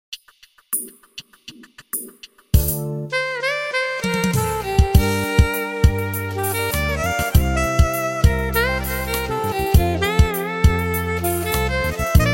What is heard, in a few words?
Beat Turk Pop